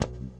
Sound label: wormfall